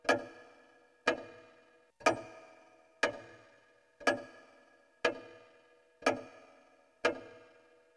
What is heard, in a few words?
grandfather clock; cstrike; rtcw